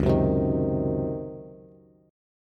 Db Major 7th Suspended 2nd Suspended 4th, Db, Bass; 9,9,10,8; DbM7sus2sus4 strummed; JGuitar